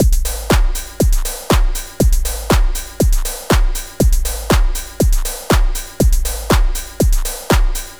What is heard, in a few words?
120 trance beat, Drum & Perc Loops